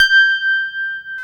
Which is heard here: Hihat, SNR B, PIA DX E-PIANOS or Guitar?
PIA DX E-PIANOS